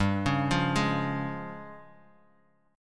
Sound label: Db Major G; arpeggiated; JGuitar